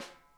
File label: snare, drum kits